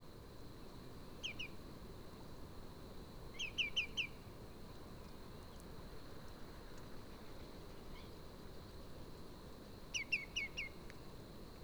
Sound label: nfc more than one